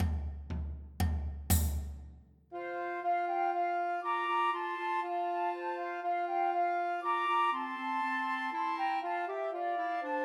The Holly and the Ivy Christmas Trios for Two Flutes and Clarinet